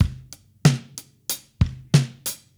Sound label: Mixed Berry Jam Drums, 70s Analog Funk; Sonic Foundry (Sony Creative Software) - What it Is! 70s Analog Funk